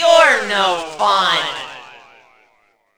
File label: stun; roblox; coolkidd; slender